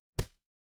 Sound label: impact slam